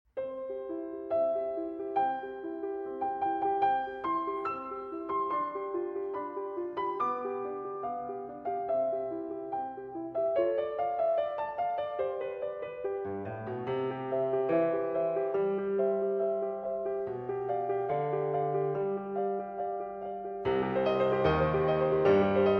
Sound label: Piano Sonatina in C Major, Allegro